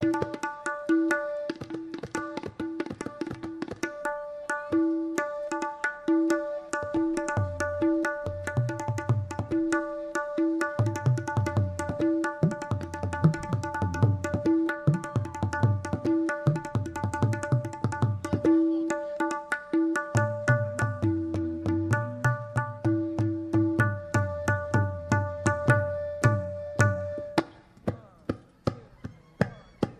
Percussion

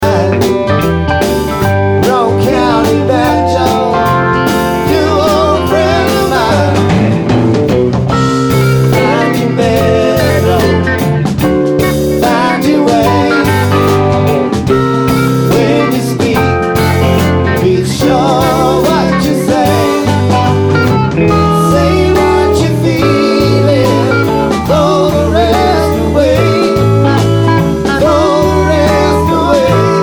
Banjo

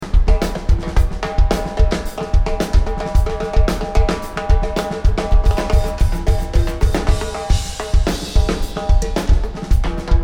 Drums

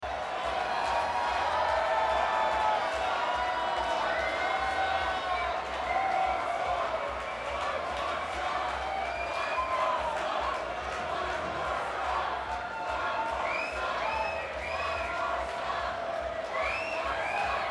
Crowd

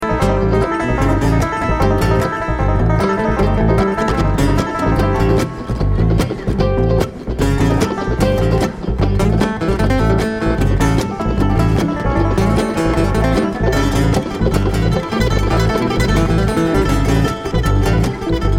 Traffic Jam

